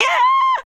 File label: Gasp; EDD; Ed Edd n Eddy - Adromulus Pack; EEnE